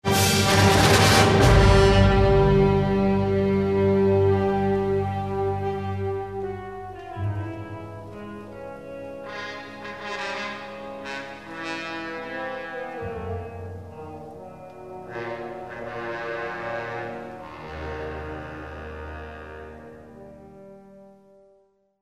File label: Swamp; Star Wars Jedi Knight Jedi Academy; Yavin IV